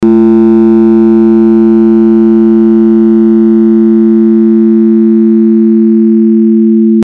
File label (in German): Simulation Grosse Glocke Ausschwingverhalten